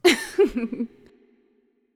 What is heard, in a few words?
laugh